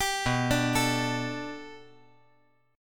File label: B Minor 7th Sharp 5th B, chord